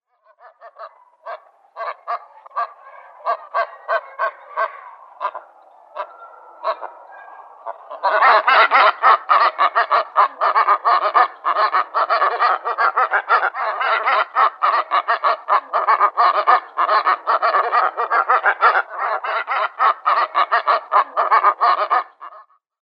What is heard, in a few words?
Brown Booby